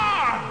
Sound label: bump; baseq2; turtle